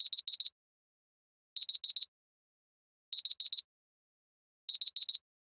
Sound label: Alarm; Optimus L3; alarms